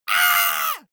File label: monster hit high; schreie